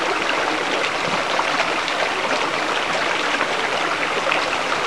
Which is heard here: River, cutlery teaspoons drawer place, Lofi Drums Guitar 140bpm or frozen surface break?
River